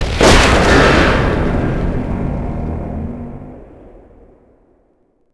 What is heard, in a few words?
distant tank destroyed; WAR